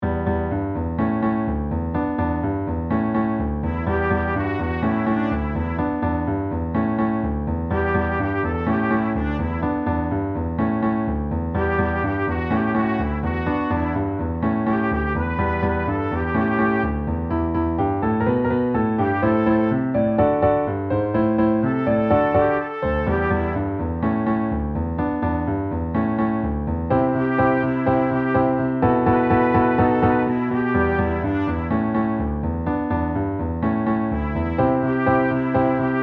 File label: Venus piano melody